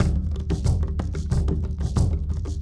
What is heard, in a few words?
COMBINATION ACOUSTIC GROOVE, LIVE ACOUSTIC PERCUSSION; Sonic Foundry (Sony Creative Software); Vir Unis Infusion